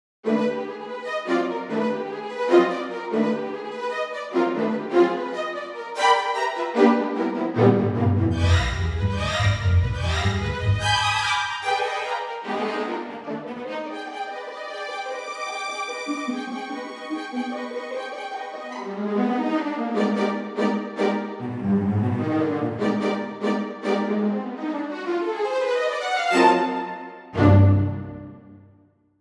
Epic Orchestra

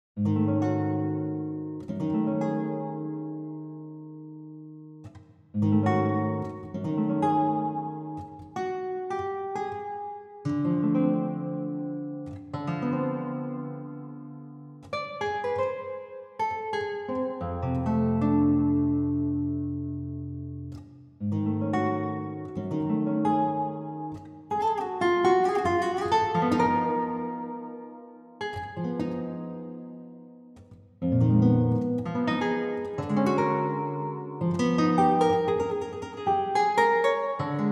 Guitar piece

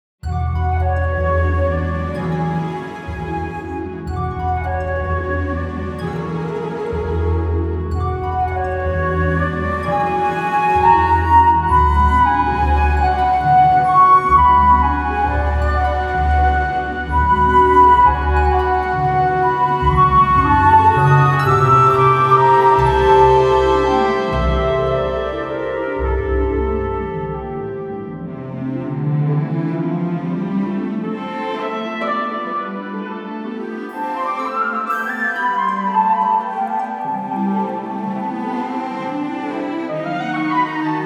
flute ensemble

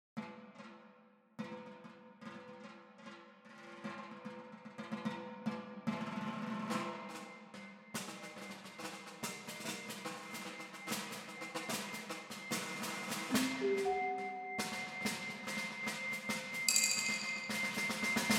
Complete perc

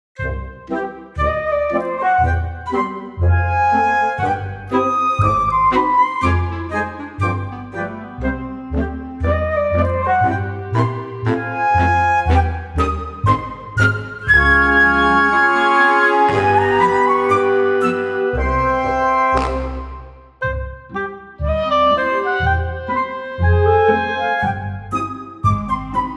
Woodwinds; Special Edition Vol 2

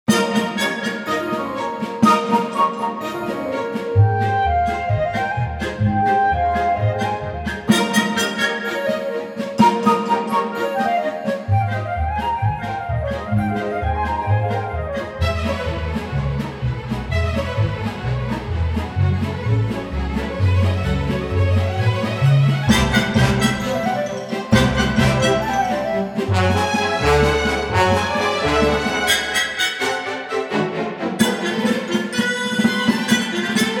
muted ens trumpets

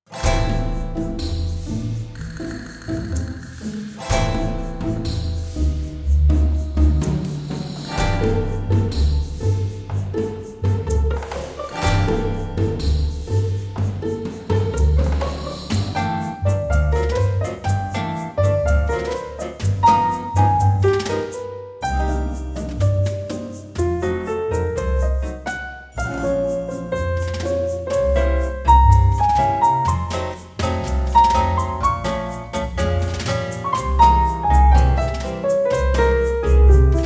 Sunny Tango

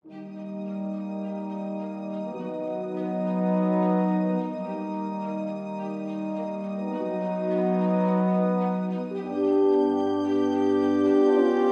Dimension Brass

